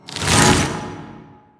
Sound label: crystallites; durax